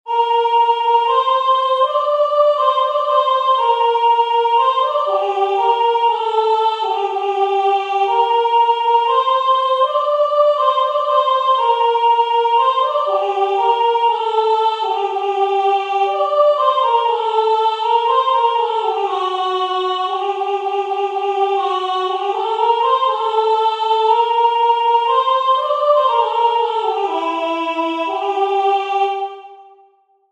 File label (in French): Chanson a boire Soprano; Bataille Gabriel